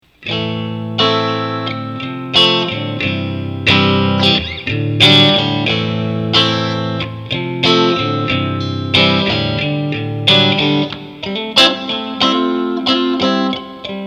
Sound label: UAD OX Amp Top Box